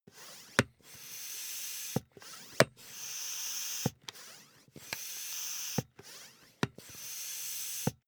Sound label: air pump ball sequence